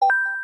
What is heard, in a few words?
message